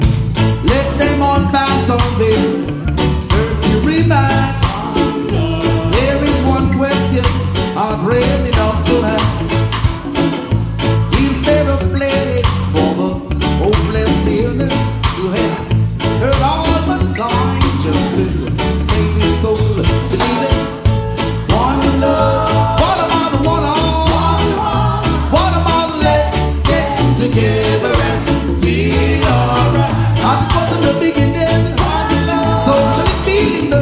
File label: Reggae